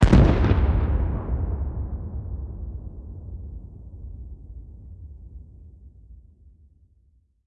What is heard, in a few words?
plane; World of Tanks